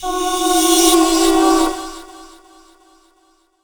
Vox, Pads; Zero-G Jungle Warfare 1, 2 & 3; 90 s Sample CDs

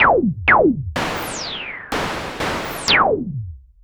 SH101 Clocked LFO Rhythm (125BPM)

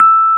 E 0, Electric Pianos; Wizoo Electric Pianos

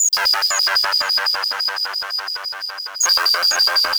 Synth loop (120BPM); musicradar

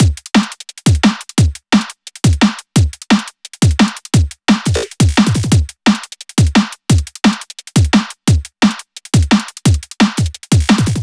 Drum Loops, 174; M8; Electronisounds

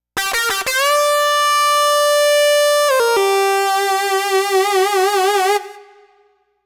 Lead Synth Lick; Dr. Fink's Funk Factory

